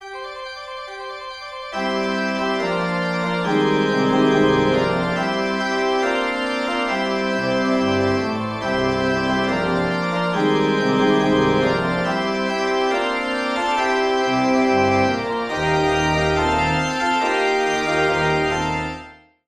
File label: Toccata Ostinata